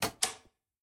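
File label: Server Battery; raw game audio files; MiSide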